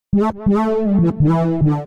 A Minor 84 bpm; MIDI Clips; Tonal; Melodic; Hyper Dee; Ableton; Factory Packs; Chop and Swing

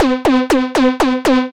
growl pitch, synth rhythmic; Ableton; Factory Packs; Build and Drop

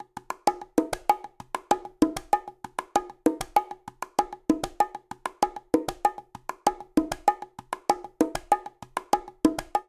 Pop Bongo 97bpm; Latin Percussion; Ableton; Factory Packs

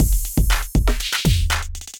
Electrified Kit; Electrified Kits; Ableton; Bomblastic

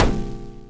Alien FM Perc Short

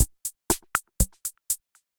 Kit, effected drum kits; Drum Machines; Ableton; Factory Packs

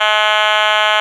Otamatone; Ableton Foundation FreePacks; Foundation Glitch Toys & LoFi

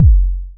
analog attacked, Kickbank; Ableton Foundation FreePacks

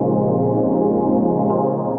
Chorale Sea, Synth Pad; Ableton; Factory Packs; Synth Essentials

